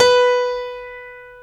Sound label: StereoGrand, Keyboards